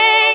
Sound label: Vocal Oneshots; Vengeance Samples; Vocals